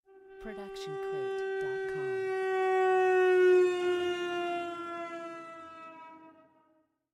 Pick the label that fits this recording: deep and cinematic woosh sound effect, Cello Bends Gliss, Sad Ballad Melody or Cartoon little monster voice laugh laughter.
Cello Bends Gliss